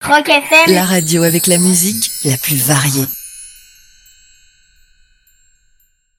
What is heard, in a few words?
Chimes; Coco et Nath; habillage sonore; roc fm